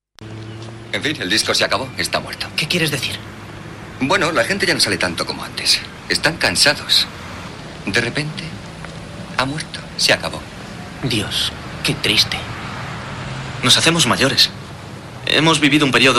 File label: funk